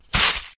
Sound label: snowball; Phineas And Ferb - Backyard Defense